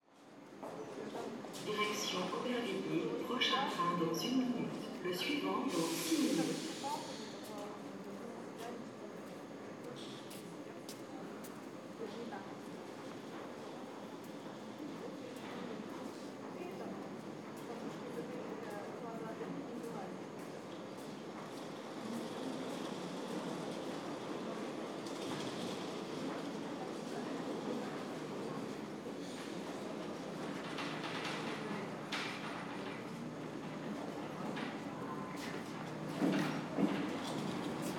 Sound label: Subway, station, train; lilesound